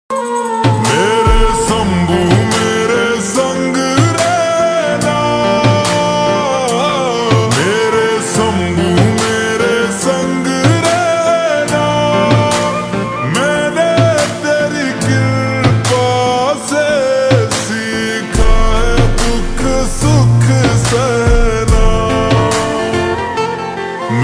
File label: Ringtone slowed and reverb